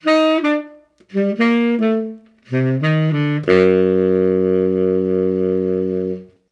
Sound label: Collection Pickup